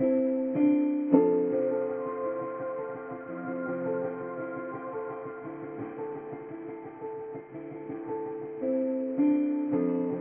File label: Piano; Stony Buildings Bright planet room